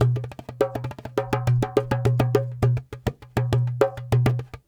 Dumbek

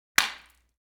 impact juicy; fights bodyfalls; Asian Show Sound Effects

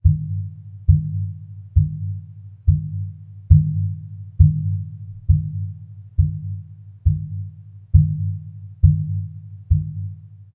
Big Drum palms; Sneak Up (Gittantmeah)

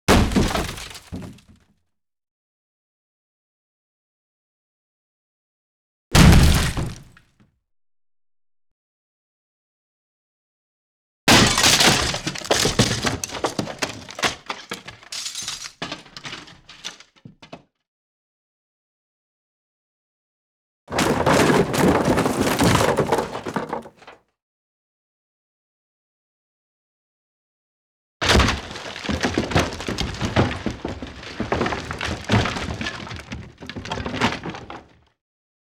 wood med impact; Asian Show Sound Effects

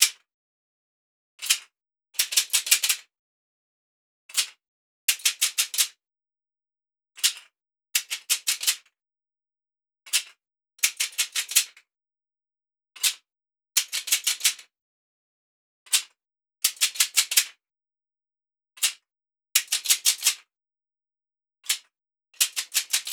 Branch rattles; Crow Hop (Weemmarategwa)

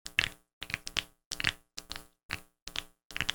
Knuckle Crack

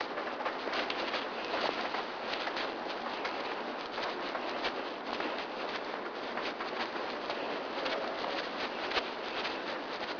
footsteps

